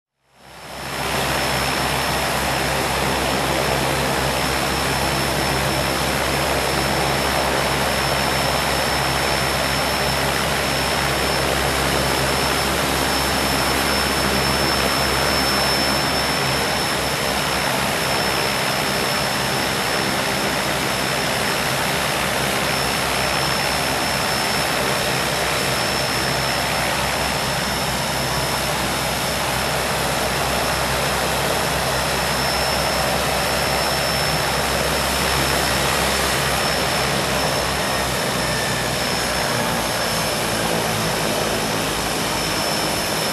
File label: Aircraft Airscrew Noise Near